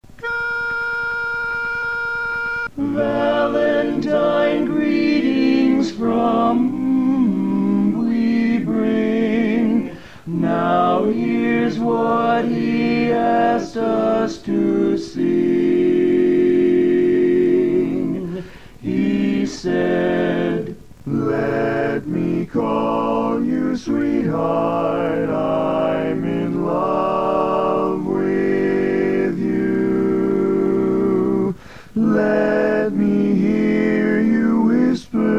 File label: LEAD Greetings, barbershop quartet